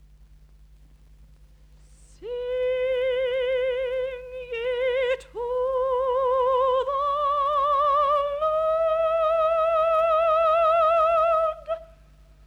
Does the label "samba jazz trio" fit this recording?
No